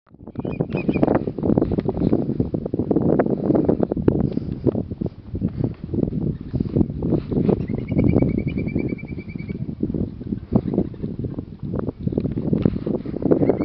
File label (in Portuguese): canto do macarico galego